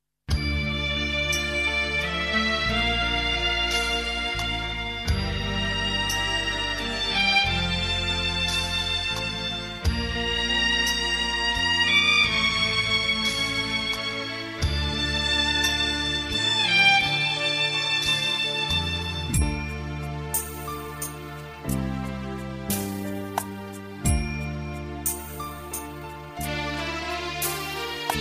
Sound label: X'an Devotional Karaoke